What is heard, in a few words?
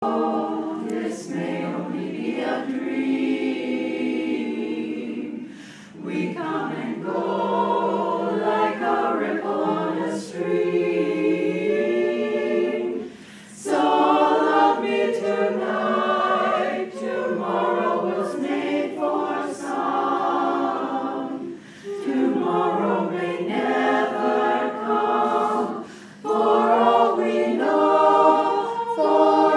Acapella